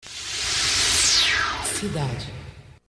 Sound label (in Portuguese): Vinheta; Cidade FM